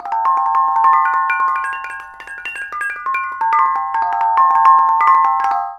KONGWONG

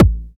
BD, BD Dance

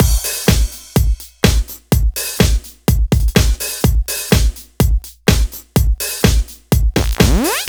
Rock Roll Full, Drum Loops 125bpm; Zero-G; Soundclash Nu Dance Electro; Acidized Wavs

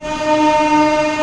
STR A1 PP; Sound On Sound; Peter Siedleczek's Orchestra

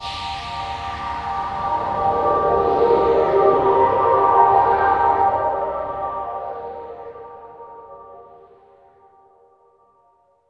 Fall, Hits & Falls; Zero-G Trance Inducer

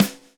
70 groove; AMG Gota Yashiki Groove Activator

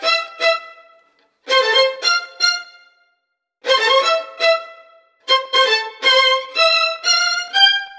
STRING LICKS, Disco

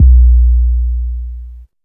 KICK XL, Big Beat; Big Beat Samples & More; AKAI

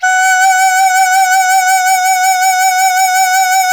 BANDI F#5, BAN-DI FLUTE; Spectrasonics; Heart of Asia

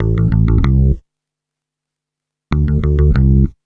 BASS, BASS GTR

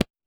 THROAT; Spectrasonics; Metamorphosis; THROATERS

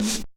Kawai K1 tight snare; wavetables

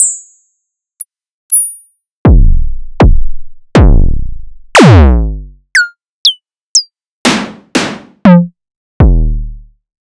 Concrete Dual Borg

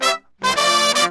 brass 110 G, brass murga; Best Service ProSamples vol.14; World Grooves; AKAI

